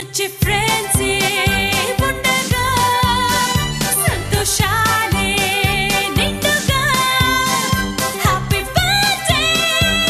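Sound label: happy birthday friend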